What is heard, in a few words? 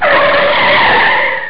slid car